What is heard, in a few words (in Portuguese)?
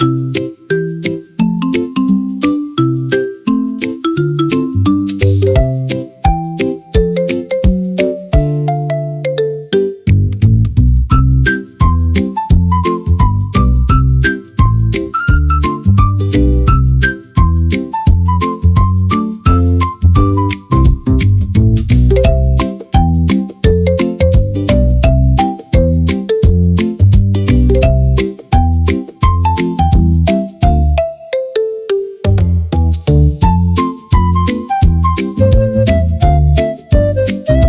musica fundo